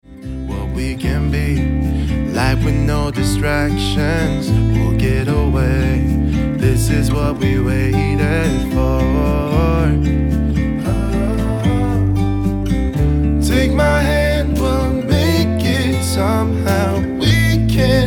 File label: Acoustic Version Ringtone